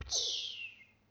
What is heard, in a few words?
door; Dev sounds